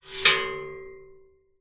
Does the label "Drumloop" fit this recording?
No